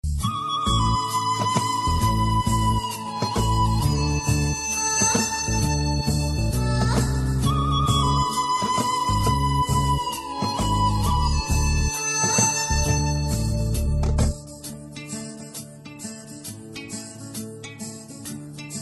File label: mobile cool ring tone; mts